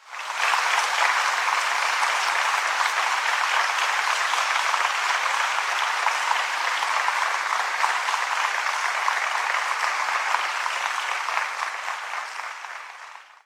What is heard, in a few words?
Finish Race; LFS